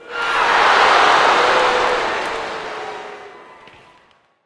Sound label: bumper car boo; Source Engine; tf